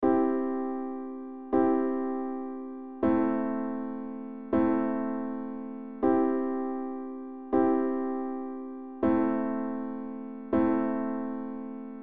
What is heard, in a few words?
I I VIm VIm